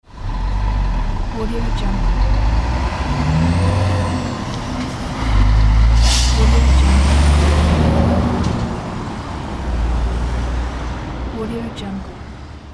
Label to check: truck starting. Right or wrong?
Right